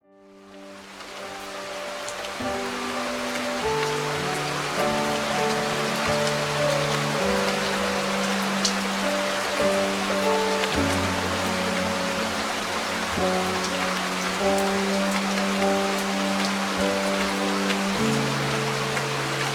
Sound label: Lightning Thunder and Rain Storm, The Sound of Rain